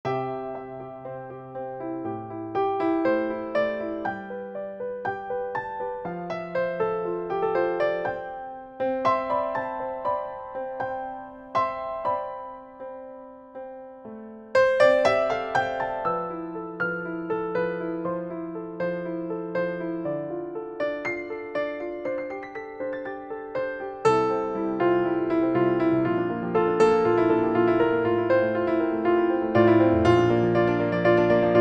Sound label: Piano Song